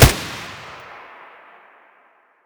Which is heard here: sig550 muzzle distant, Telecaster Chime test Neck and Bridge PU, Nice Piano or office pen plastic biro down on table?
sig550 muzzle distant